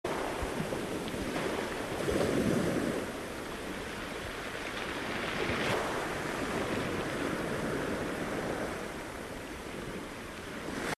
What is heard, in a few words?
wave; fishing